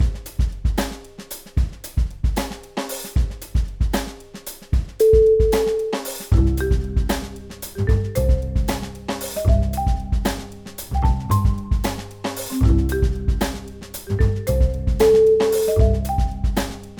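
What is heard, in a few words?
fun joyful jazz, music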